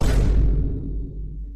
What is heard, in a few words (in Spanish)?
impactante